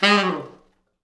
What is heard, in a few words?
T Sax falls, Horns Sax; East West; Quantum Leap Horns Sax